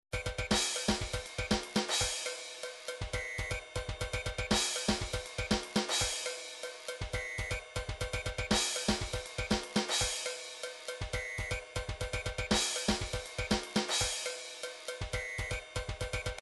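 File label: drums pattern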